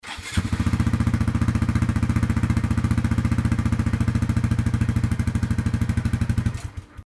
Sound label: monkey125 idling